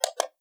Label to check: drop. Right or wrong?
Right